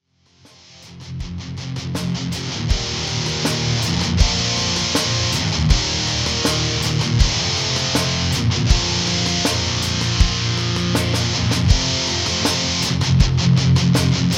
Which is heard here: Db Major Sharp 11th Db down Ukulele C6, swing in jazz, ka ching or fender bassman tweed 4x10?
fender bassman tweed 4x10